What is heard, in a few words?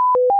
beep